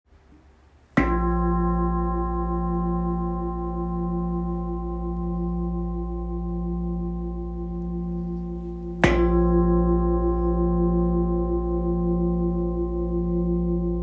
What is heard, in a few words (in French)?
Gros bol Fa